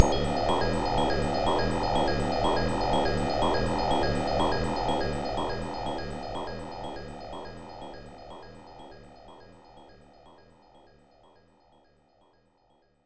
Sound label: Loop (Machine Room); rpg tsukuru dante98 ii pc-98 1996